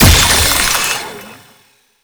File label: Void Heavy Scythe Crystal Embed; Warframe; Duviri; Incarnon Adapters; Melee